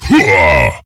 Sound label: pain; awesomenauts; Deadlift